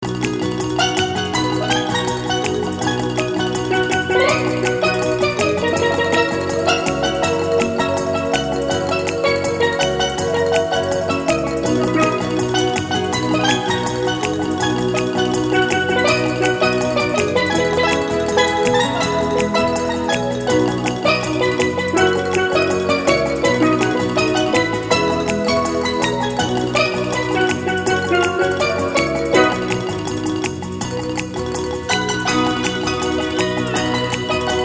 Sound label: Caribbean Dancing